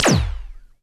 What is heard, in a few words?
Small Multi Beam; Battle Suit Aces